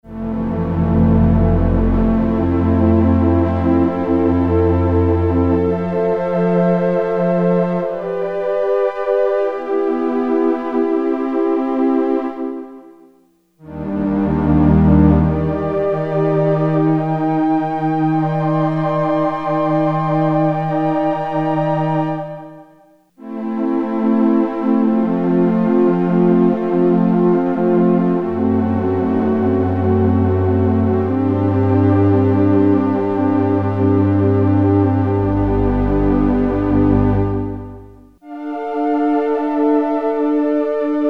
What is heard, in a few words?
LO STRINGS, Roland Jupiter-8; Factory patches